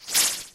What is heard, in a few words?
spell